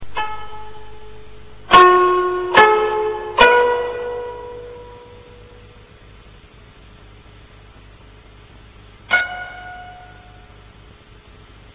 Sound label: koto